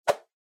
Motion Swish; モーション・スイング

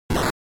NES RPG Damage

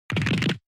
Fracture Dry; 骨折01-1(ドライ)

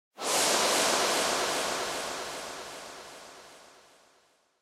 Scene Flashback Long

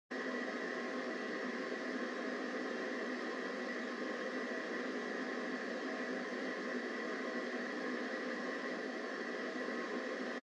Radio Signal Noise Far AM

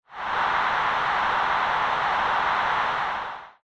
Soccer Cheer; SNES

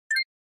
Short Accent Dry

